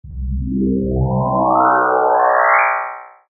synth space weird synthy sci fi power up spaceship flange